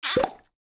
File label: damage vehicle; World Of Warships